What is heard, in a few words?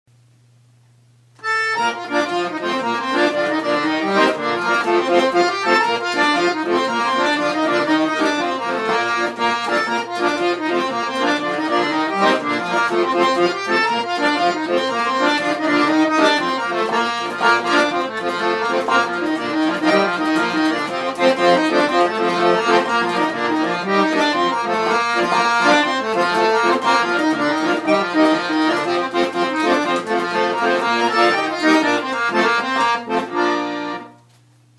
Jig